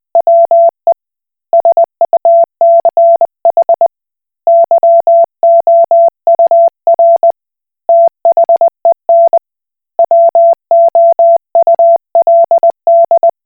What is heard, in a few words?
Koch Morse